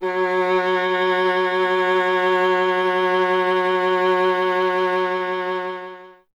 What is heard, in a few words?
viola F; Miroslav Vitous; Solo Instruments VOL-1